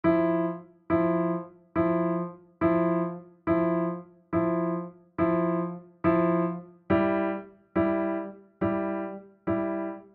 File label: piano